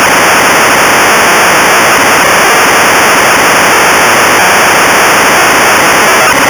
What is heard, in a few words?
noise 19